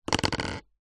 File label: food ice cream cone place down on surface; zapsplat